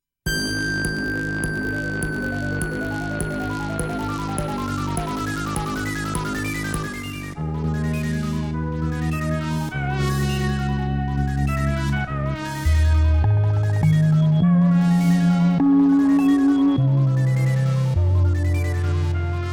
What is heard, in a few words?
Scene of Thunderstorm; Cameltry; Cadash